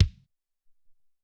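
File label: Close Kick 1, Alesis SR-18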